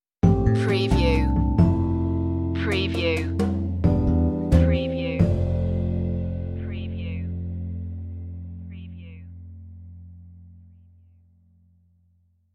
jazzy idents

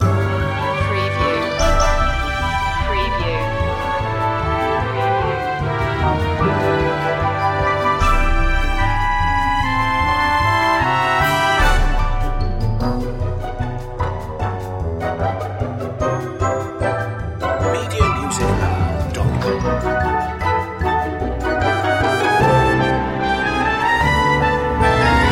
nostalgia tv

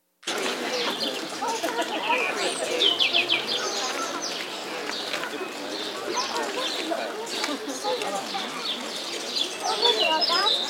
Ambiance Zoo Birds People

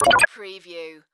Blip Beep; sound-effects-bible

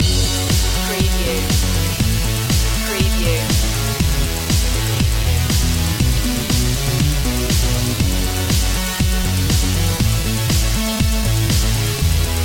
electro bass dance loop

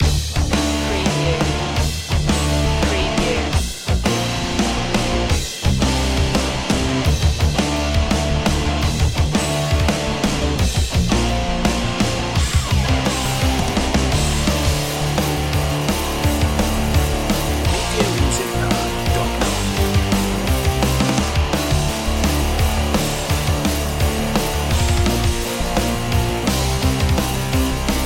8bit rocktronic underscore

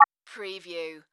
Multimedia Beep

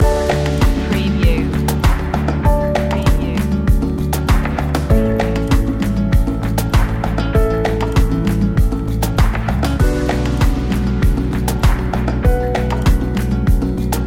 natural tendencies loop

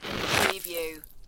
Snow and Ice, Ice scrape with ice fragments

